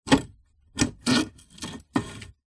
household chair outside metal tube frame movements concrete